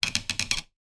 wood squeak creak; foley; zapsplat

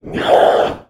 Demon; little robot sound factory

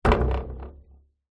impact metal table leg vibrate; zapsplat

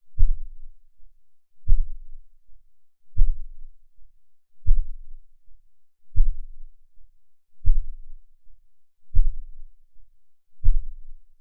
human heartbeat slow steady designed; zapsplat

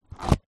food egg cartoon cardboard close; zapsplat

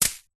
leisure hacky sack catch

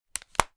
video game nintendo ds console close, household

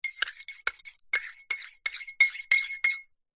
food drink stir coffee mug spoon slow; zapsplat

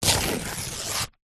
paper rip thin magazine page; foley

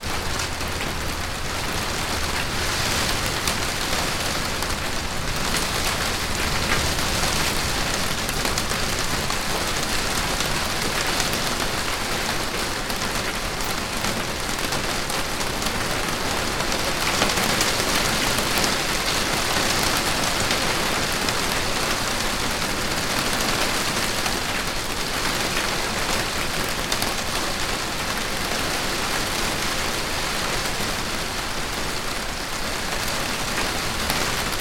weather rain heavy recorded in greenhouse

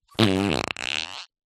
cartoon fart

